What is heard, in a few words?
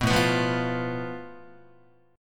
A Suspended 2nd Sharp 5th, Guitar; Asus2#5; strummed; 4A,4E,5A,5D,5G#,6C#; JGuitar